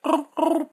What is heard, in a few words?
bulb bulb frog; SyrinScape; Syrinscape unplugged; Jungleplanet dinolaser battle